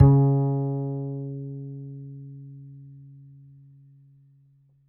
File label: D string upright bass; Upright Acoustic Bass